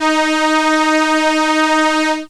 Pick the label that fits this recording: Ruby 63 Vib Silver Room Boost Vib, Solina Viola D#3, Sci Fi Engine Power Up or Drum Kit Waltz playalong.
Solina Viola D#3